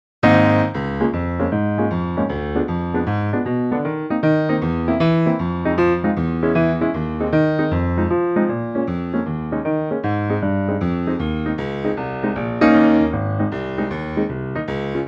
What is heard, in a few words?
Ab jive Piano